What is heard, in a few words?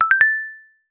cute dating app notificat